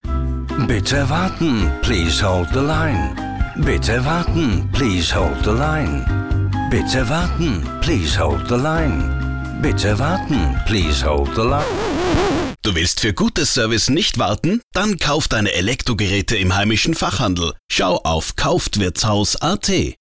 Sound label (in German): elektrofachhandel warteschleife